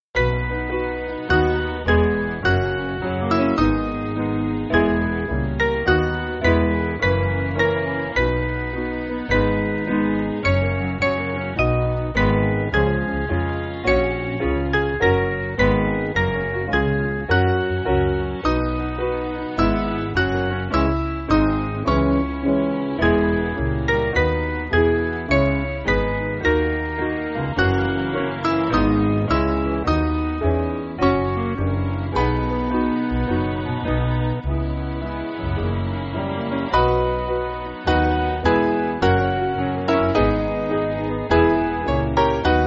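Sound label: Piano, Backing Files for Churches; Christ The Fair Glory; Coelites Plaudant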